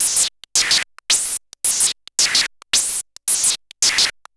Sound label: MiniBrute 110BPM, Gated Seq Noise